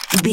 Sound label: gun rifle magazine load